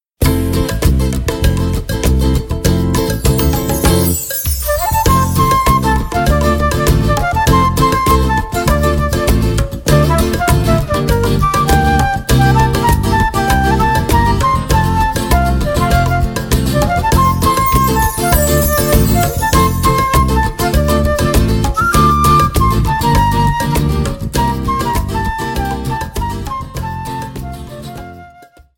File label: Kick